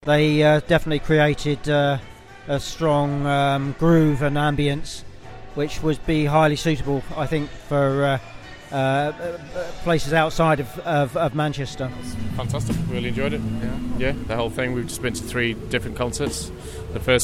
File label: audience view quartet; the slowlight quartet